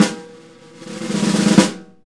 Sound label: snare, Roland LA Composer; Roland - LA Composer vol. 1